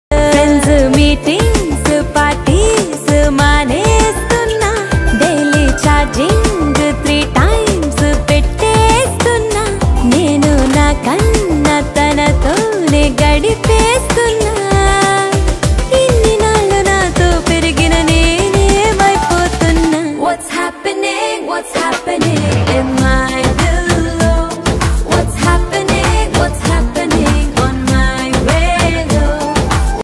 telugu ringtone

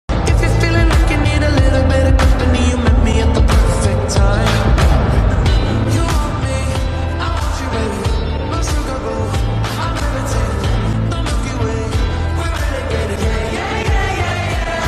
lofi english ringtone

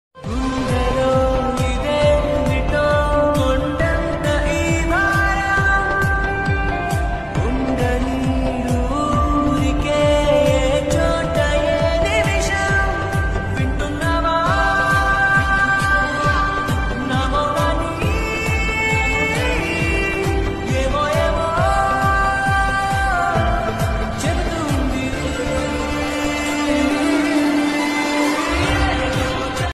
slow reverb ringtone, tamil ringtones

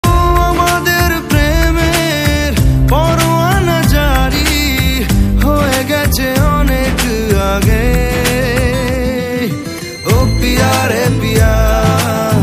bengali ringtone

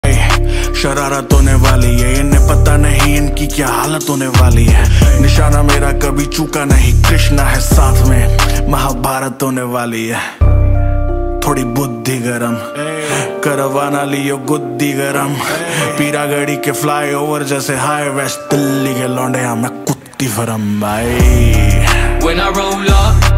hindi rap ringtone

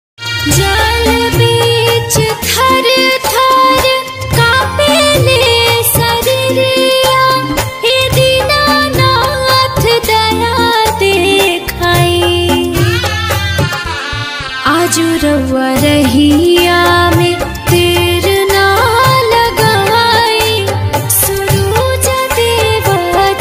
bhakti ringtone

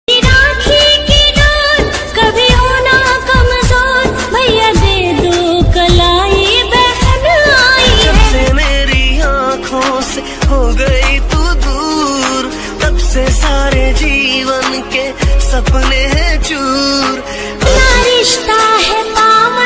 hindi bhakti ringtone

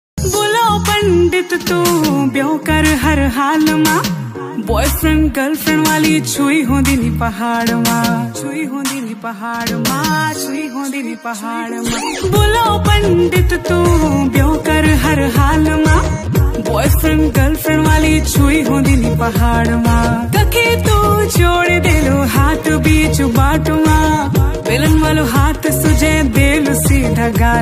uttrakhandi ringtone

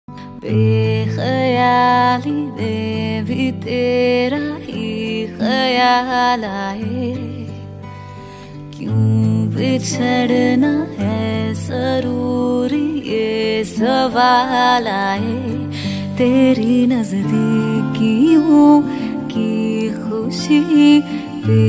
Acoustic ringtone

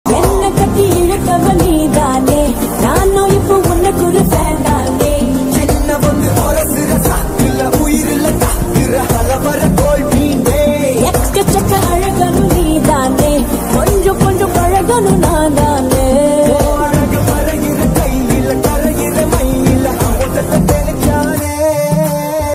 tamil ringtone

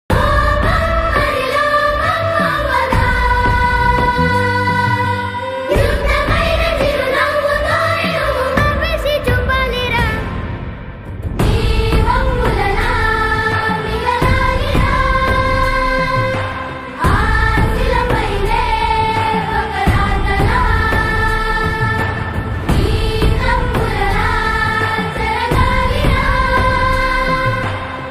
telugu ringtone

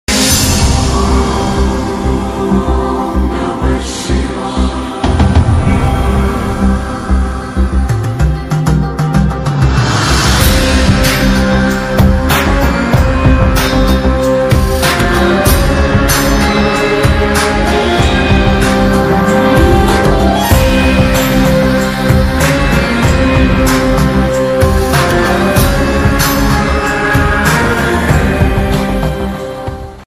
Devotional BGM ringtone

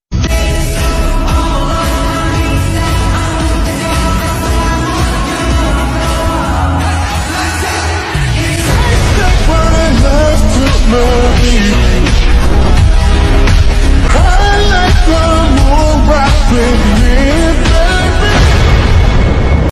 slowed korean ringtone

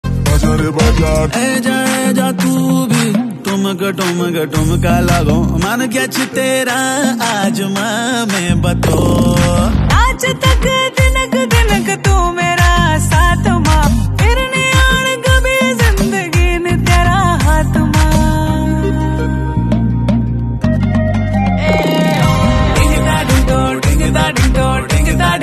uttrakhandi ringtone

